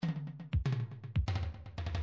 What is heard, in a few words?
midi drums tom